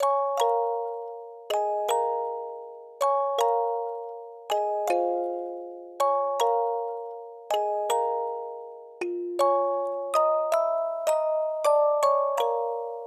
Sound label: Music Box